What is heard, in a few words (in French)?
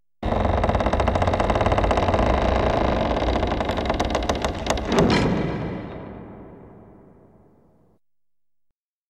Bruitage porte; Blanche Neige et les Sept Nains